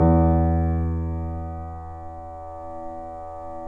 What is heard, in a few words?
PNO PP; Synclavier Sampler Library